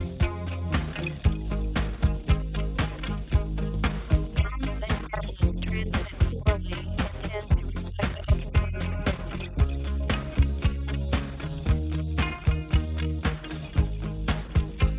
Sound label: mus